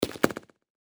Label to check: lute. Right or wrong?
Wrong